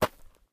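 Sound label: gravel, steps; Terrarum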